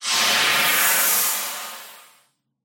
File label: ambient steam rush; ambient air; Half Life Alyx